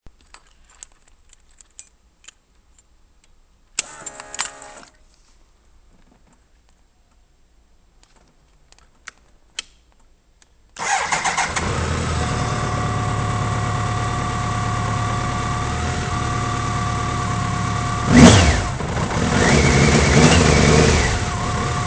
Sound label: BMW K 1100 LT SE